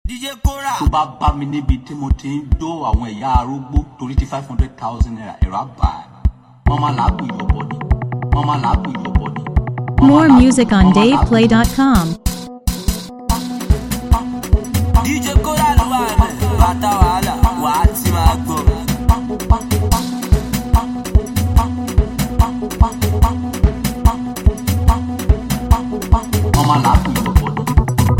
Beat

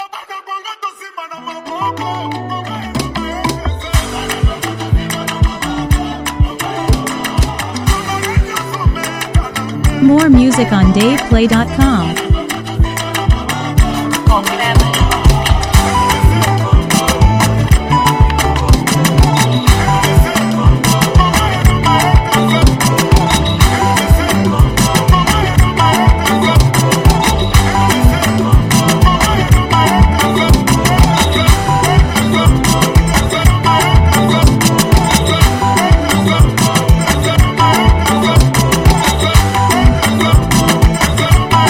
Congo Soukous Sped Up

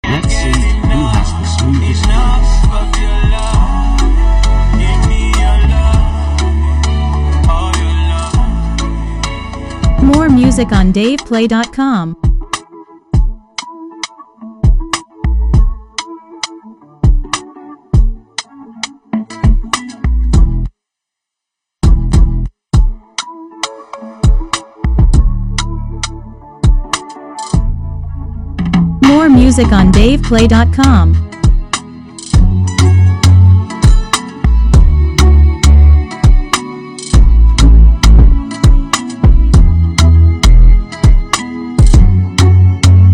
Instrumental Open Verse

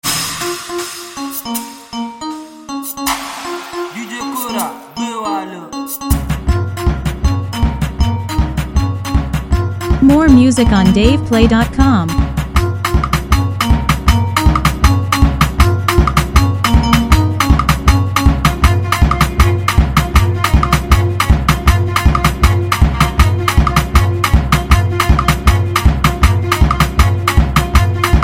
Beat

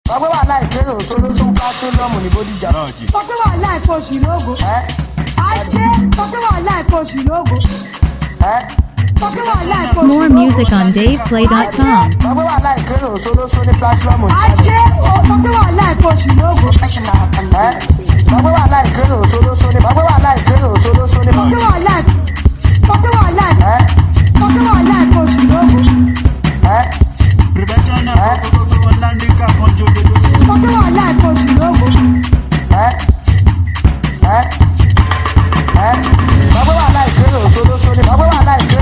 Beat

